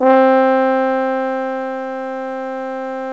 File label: French Horn; GMTones